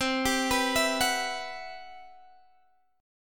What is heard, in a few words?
C Major Sharp 11th, Guitar Open G